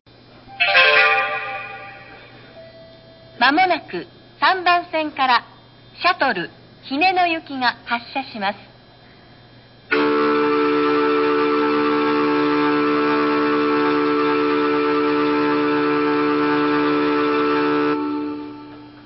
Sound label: kansai airport track 3; nishinihon; shuttle